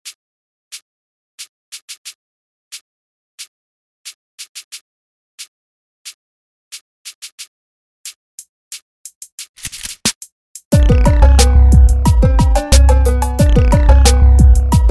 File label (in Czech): mandolina